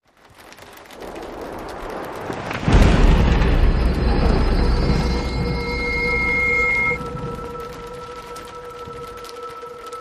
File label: Raining Pouring Horror, No Melody